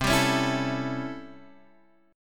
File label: C 6th Flat 9th chord; C6-9 strummed